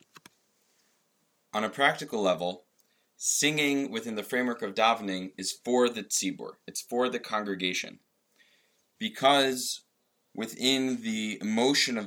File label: Singing Portions of Davening; Shacharit